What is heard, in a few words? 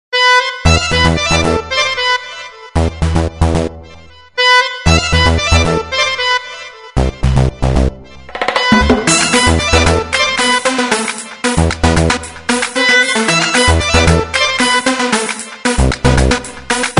Left Right Left Tune